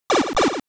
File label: Pipe Travel; SML2